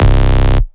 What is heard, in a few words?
Basses Root C